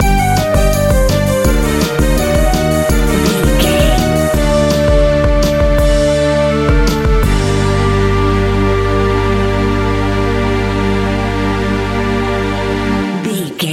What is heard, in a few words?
indian war music stinger, An exotic and colorful piece of World music. Groovy, powerful, exotic and wild.